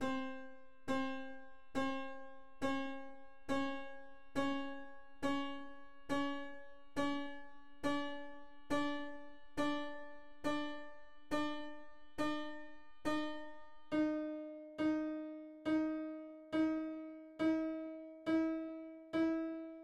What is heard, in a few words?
72-tet scale on C